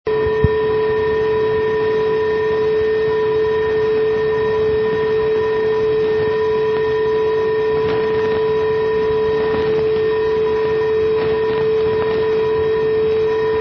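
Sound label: non-directional beacon; GAC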